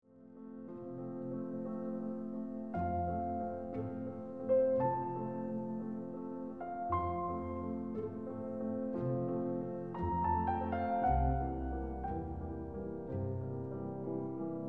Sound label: Piano Concerto Andante